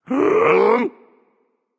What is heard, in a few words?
breath, voicepack; smite; jorm